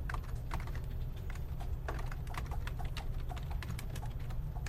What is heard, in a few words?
office; Original TK Sounds